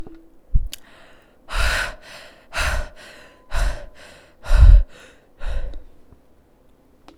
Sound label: breath